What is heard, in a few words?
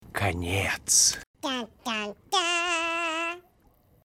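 end tada; zvuk so slovom konec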